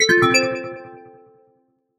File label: pinball level up